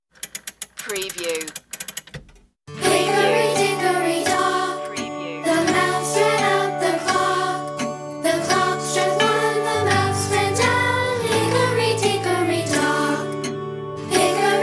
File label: hickory dickory dock children group vocal alt